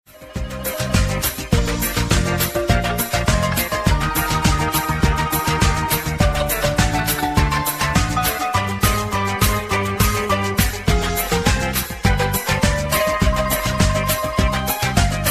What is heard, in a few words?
tic tic ringtone